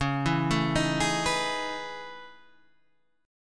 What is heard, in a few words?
A Major Sharp 11th, Db